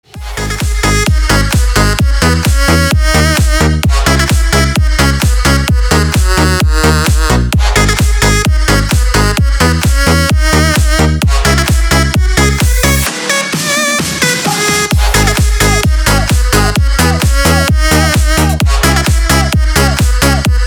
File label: super drum